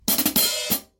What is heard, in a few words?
Hi Hat